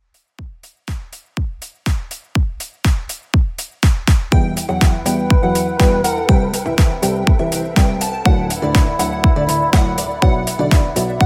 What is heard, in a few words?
Club 122 Bpm; Redrum